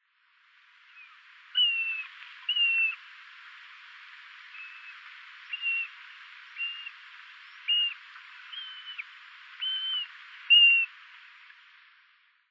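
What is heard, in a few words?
common buzzard, aomori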